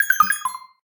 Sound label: SMS